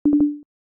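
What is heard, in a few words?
dialog question, Ubuntu System Sounds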